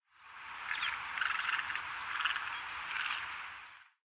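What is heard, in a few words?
Great Egret; himeji; koe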